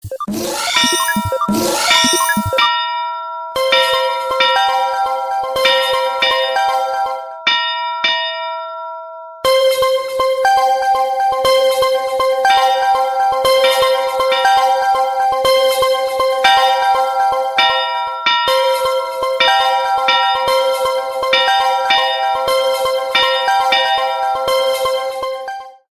alarm galaxy orgin 3d

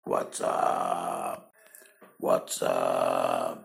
Whatsapp, ringetone

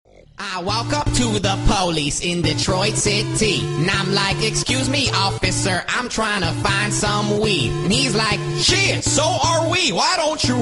cops remix

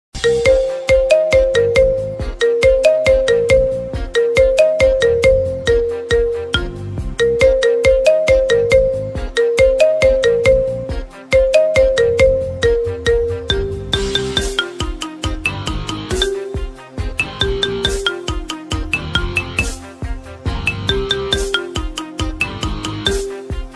marimba, ringetone

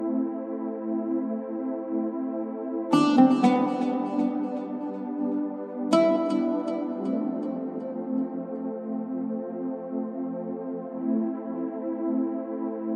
Galaxy Z Fold 4 ringetone

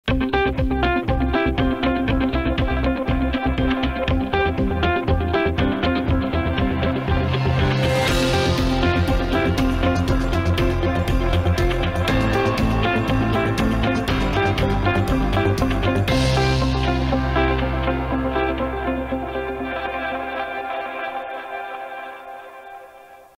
Galaxy Z Fold 4 ringetone; mobiltelefon

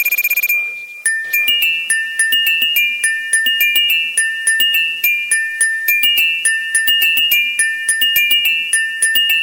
iphone romantic sms, ringetone

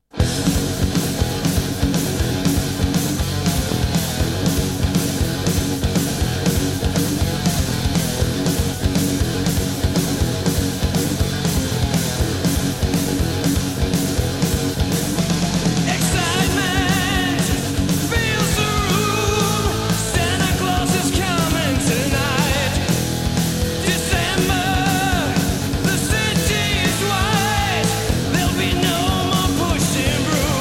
heavy christmas; mobiltelefon

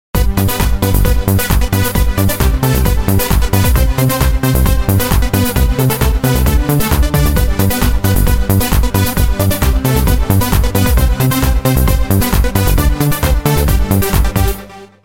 jingle bells dance

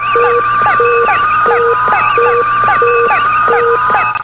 Star Trek Bridge

